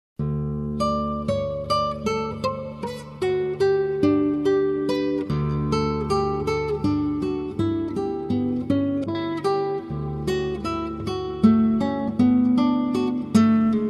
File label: guitar; BWV 998